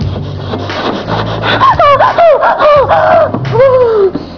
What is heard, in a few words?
ooh